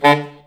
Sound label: SAX A. STAB, Alto Section; Roland L-CD702